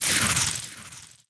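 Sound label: MON INSECT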